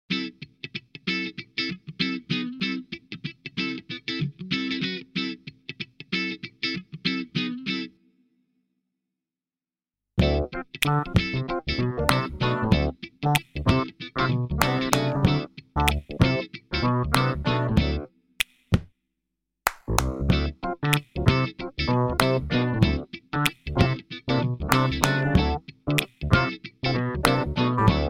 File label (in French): musique